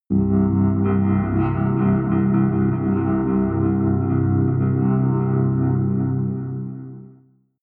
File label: synth drone rattle rhythmic, drones